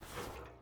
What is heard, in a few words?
water jug deploy; Rust